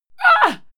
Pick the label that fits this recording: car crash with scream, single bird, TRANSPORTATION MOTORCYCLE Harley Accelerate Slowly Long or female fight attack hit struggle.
female fight attack hit struggle